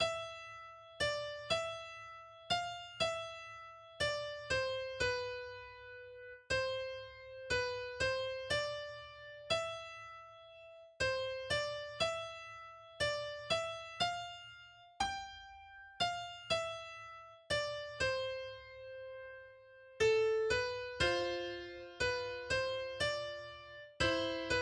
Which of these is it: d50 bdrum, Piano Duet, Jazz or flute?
Piano Duet